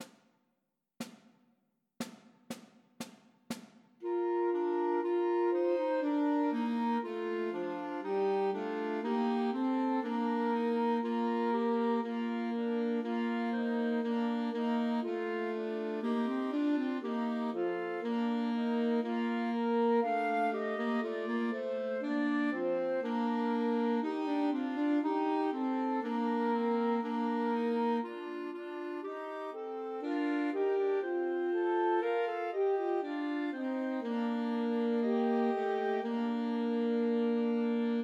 Christmas Trios for Flute Clarinet and Alto Saxophone; Good King Wenceslas